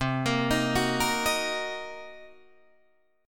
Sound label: C Minor 7th Flat 5th Guitar Drop D, chord